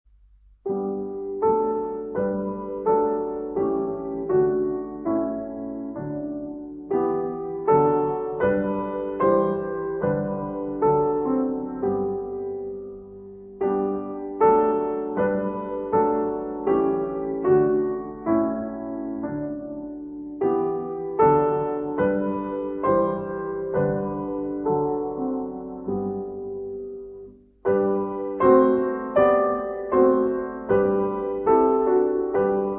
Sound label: Ein Choral (A Chorale)